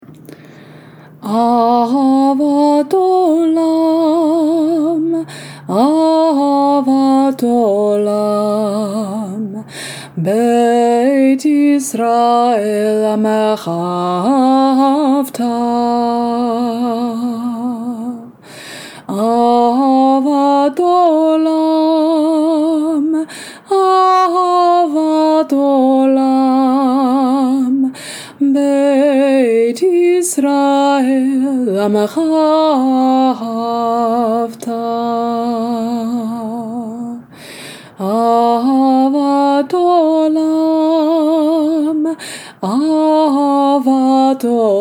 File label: ahavat olam chant